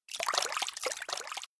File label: shrimp